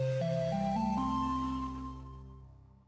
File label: intro sound